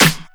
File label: Dry Steel Snare Drum E Key, Royality free snare one shot tuned to the E note. Loudest frequency: 3224Hz; modern snare drum